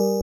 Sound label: BELL